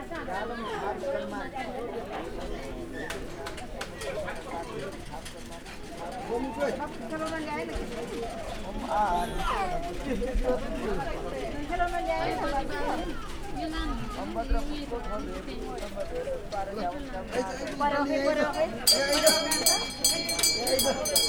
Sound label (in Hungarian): majmok temploma bazár csilingelés